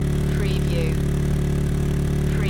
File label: Motorcycle Dirt Bike Loop RPM 3000 Exhaust Perspective